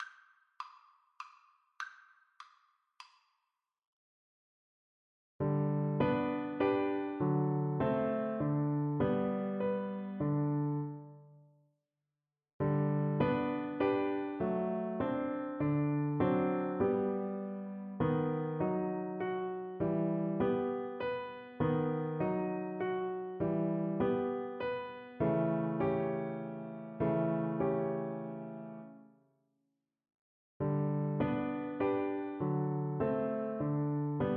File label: chopin wish flute